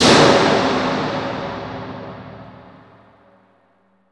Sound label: SLAM Airplane Fuselage Long Metal Stick